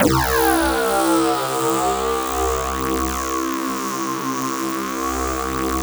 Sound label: RING BASS, Pads; Distored Reality 1&2